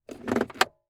Chevy Trailblazer Shift Into Gear Car Off; transportation

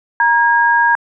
dtmf tone D

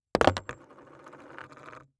Impact Coins Multiple Coins Drop On Cardboard; Impacts

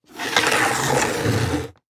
Impact Plastic Drag And Slide On Cement; Impacts

